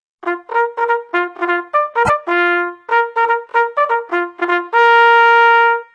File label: Attention, bugle